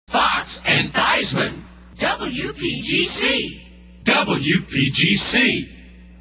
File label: jingles shouts